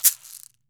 CAXY, CAXIXI; Brazil

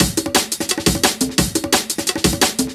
DNB BREAK

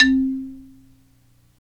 XYLO, Afro Xylophn; Roland LCDP11 Africa VOL-1; Bala & Xylo

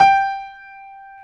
PIA PRO, Roland

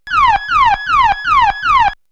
Siren; X-Static Goldmine

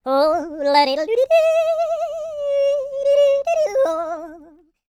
YODEL; ILIO; Vocal Planet

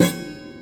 Prepared Piano; Battery 3 Sample Kits

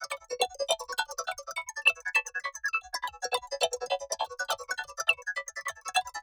instrument layer; lead arp chord melody

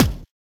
Hi Kick; Kick Snare Layers; Drum Bits; layering

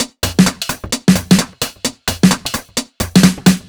130 Riddim Clash No Bd, Drum Loops 130bpm; Zero-G; Soundclash Nu Dance Electro; Acidized Wavs

